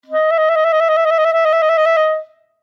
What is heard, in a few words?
Clarinet Trill; Woodwind; Blastwave